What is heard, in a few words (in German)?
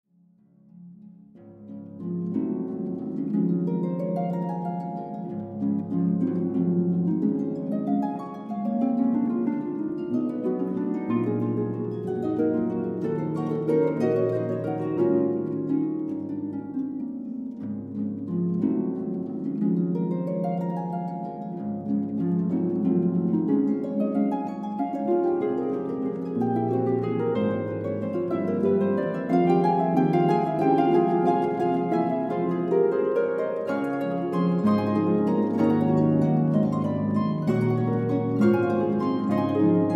Blumenwalzer